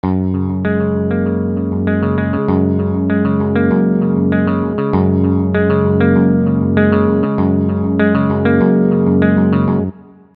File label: Electric Guitar